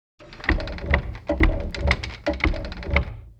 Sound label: Spin Button Down Long